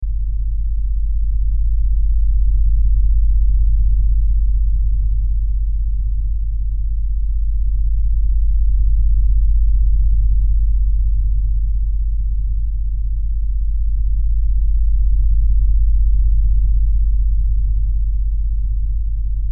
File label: Lfe Drone Low Bass Rumble; Blastwave; Sound Design